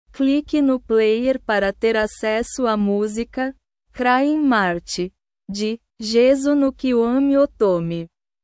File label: crying march